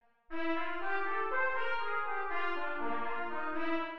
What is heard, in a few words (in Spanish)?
Dominante inv0 en C